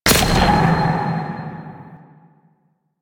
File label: Fishing Fish Impact; Duviri Maw Fishing